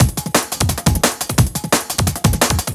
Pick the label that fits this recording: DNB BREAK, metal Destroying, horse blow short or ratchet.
DNB BREAK